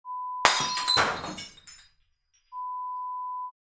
Vase smash

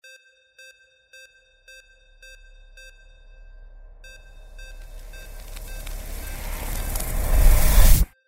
sci fi magic ice end; windy beach audio

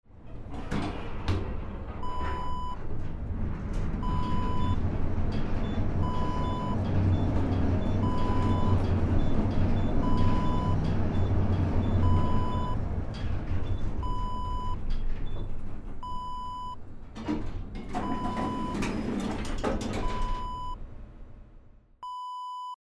elevator interior

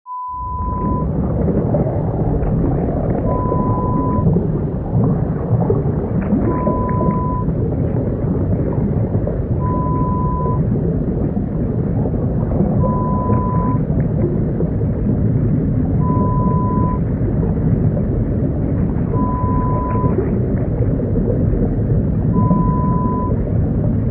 Drowning underwater, Horror Sound Effect

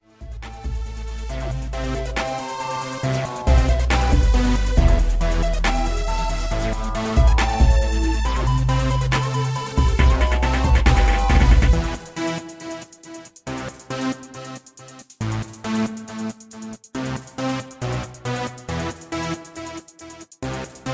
69BPM

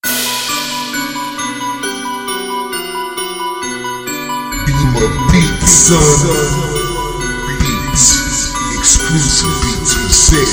Trap Hip Hop

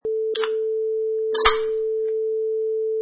Open Soda Can

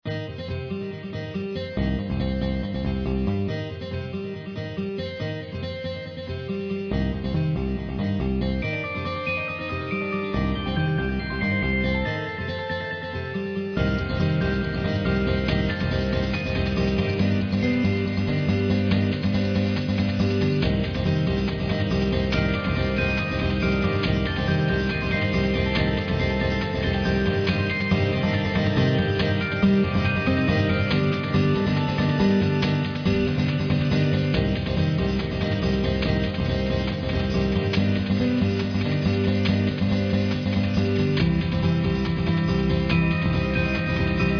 Acoustic Guitar Groove

